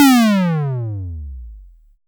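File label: SYNTOM MD F, analog toms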